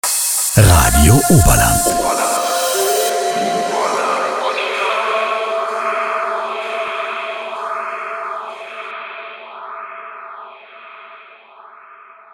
Universal Transition; RADIO OBERLAND

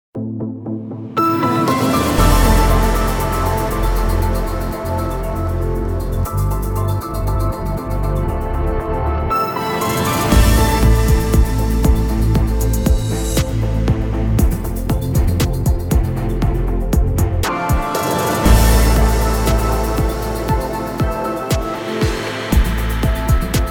Main Music Theme; ARD Infonacht

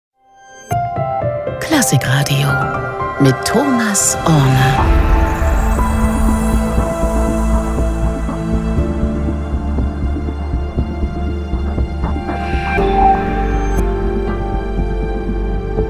Show Opener fast

